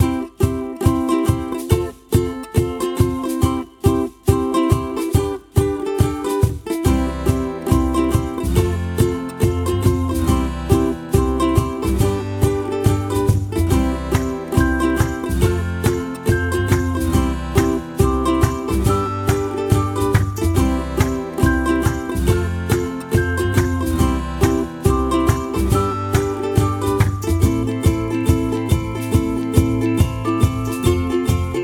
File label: Happy Ukulele; background music